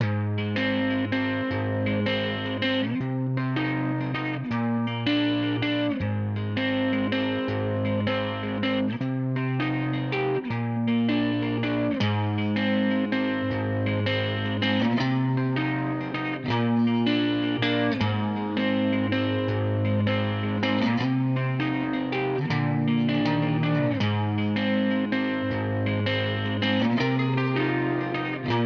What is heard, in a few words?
guitar sweet melancholy dreams 160bpm